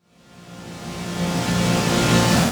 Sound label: Reverse Synths A#; Vengeance - Essential Clubsounds Vol.4